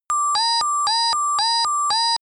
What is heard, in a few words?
sci fi alarm small personal beep